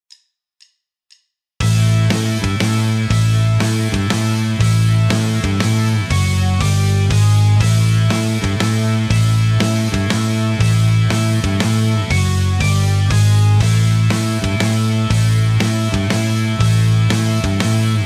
backing, guitar; Rockschool; Guitar Techniques 2018 Issue 289 December

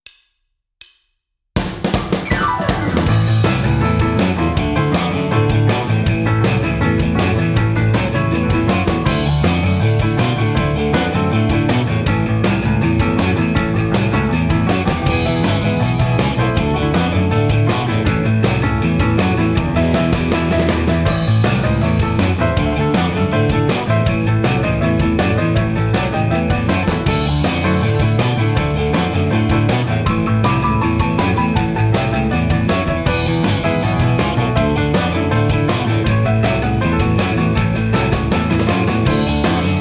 Rhythm Guitar; Guitar Techniques 2018 Issue 289 December

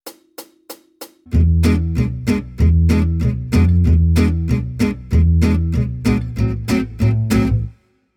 Jazz, Guitar; Guitar Techniques 2019 Issue 290 January

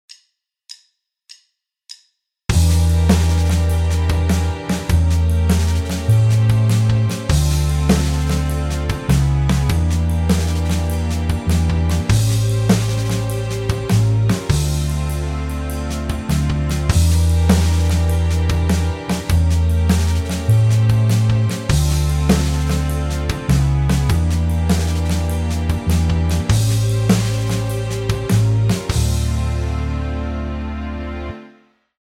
backing; Guitar Techniques 2018 Issue 288 November

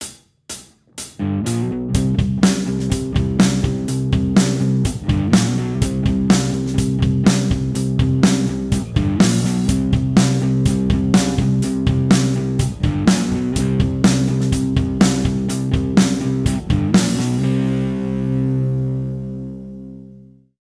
Slide, Guitar; Guitar Techniques 2019 Issue 290 January

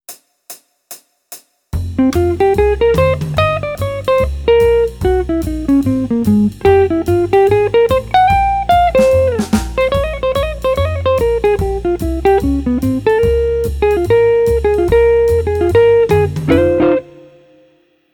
Jazz, Guitar; Guitar Techniques 2018 Issue 289 December

